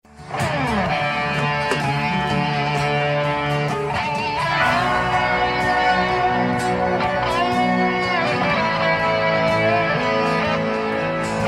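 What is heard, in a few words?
Solo Comparison soundboard, guitar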